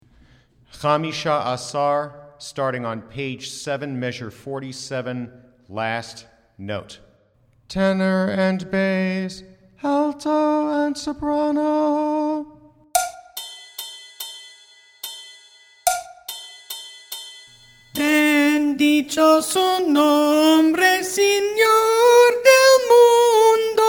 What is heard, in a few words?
alto 2 on baritone only